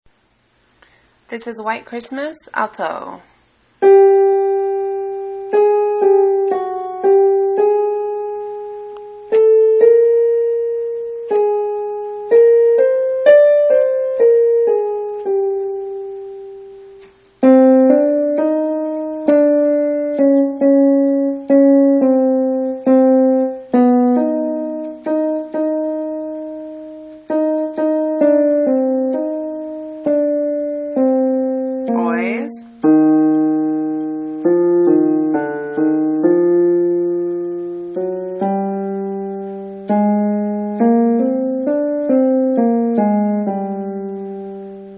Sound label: alto